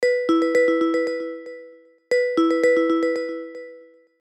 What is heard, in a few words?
incoming Call